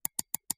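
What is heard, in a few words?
computer mouse click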